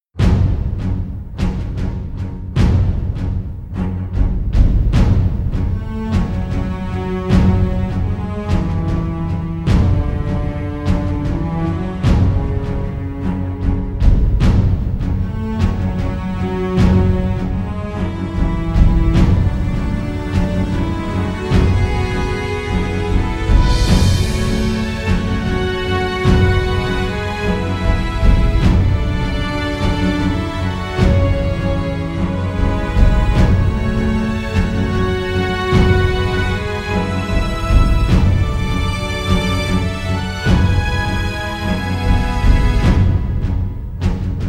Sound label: bgm, RockMetal